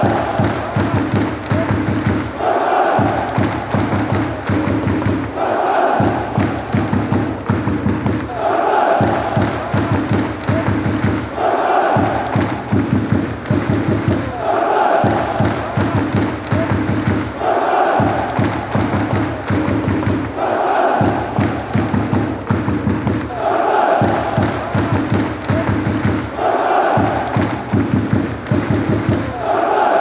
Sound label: deutschland clapping, fanchants